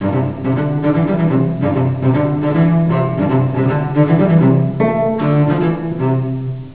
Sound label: scaird pop goes the weasel